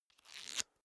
small book riffle through fast

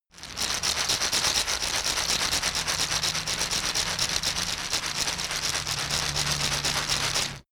shaker wood rough rattle slow soft

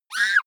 child shriek

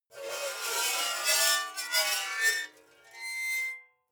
metal scrape rich sequence short

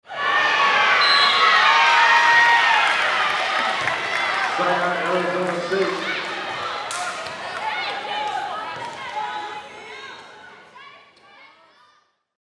volleyball match

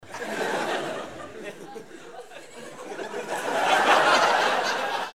laugh long

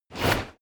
scrape

